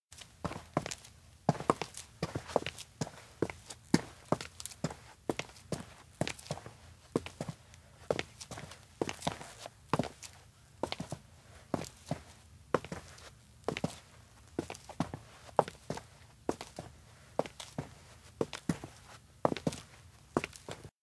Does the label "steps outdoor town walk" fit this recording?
Yes